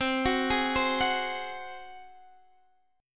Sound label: F sharp Suspended 2nd Flat 5th C, Chord